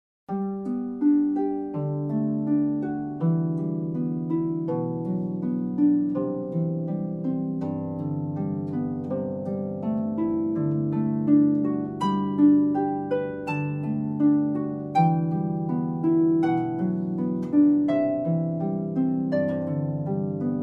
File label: Harp; Kari Gardner; Canon by Pachelbel